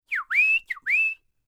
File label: WHISTL; Spectrasonics Vocal Planet; Jazz FX